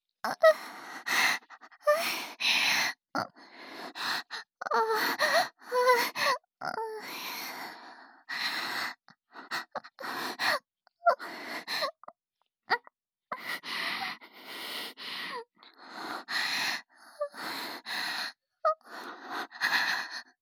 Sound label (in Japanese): 大きな声ではなく、溢れるように感じている喘ぎ声